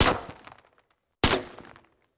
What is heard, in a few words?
Bullet Ricochet; Sound Ideas; Guns